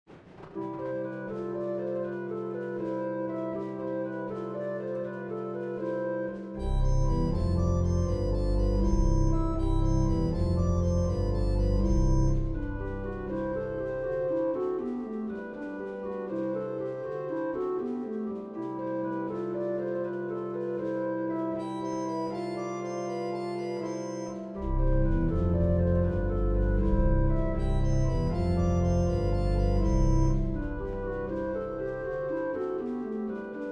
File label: OrganTeq; Schumann Reaper s Song